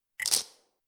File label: alert